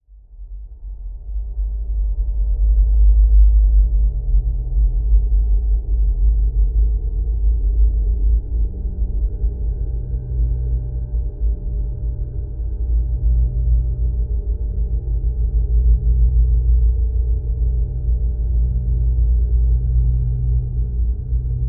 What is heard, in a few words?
Production Element Title Transition Bed